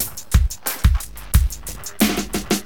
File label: DM LOOP, Hip Hop, Drums; LiveAct Pool 1